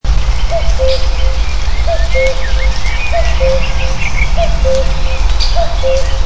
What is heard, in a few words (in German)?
kuckuck